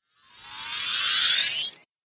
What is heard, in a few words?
Santa Sleigh Spell, magic